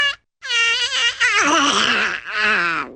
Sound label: duck yawning